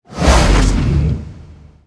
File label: Fire Titan skill